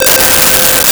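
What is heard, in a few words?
Square Wave C5; Nintendo; July 2020 Gigaleak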